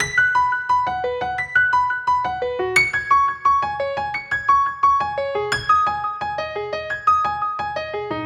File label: Key piano; Luxembourg Zwee